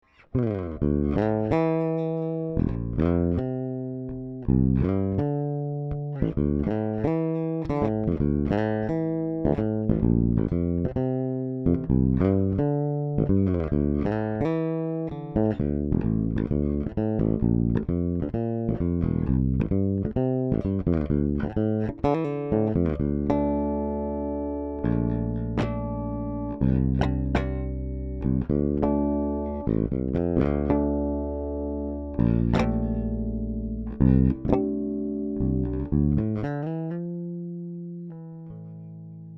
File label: Fodera Fingerstyle Bridge PU